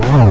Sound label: boss hurt; zsdx